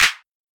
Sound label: CLAP; RhythmGame